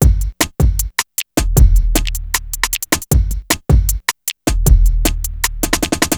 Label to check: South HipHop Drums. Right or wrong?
Right